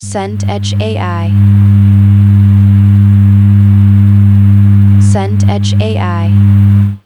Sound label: bed computer fan